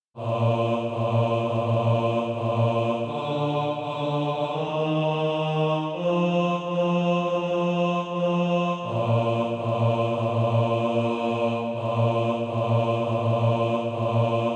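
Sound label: bass, Sheet music (SATB) including Practise Music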